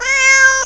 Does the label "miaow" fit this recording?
Yes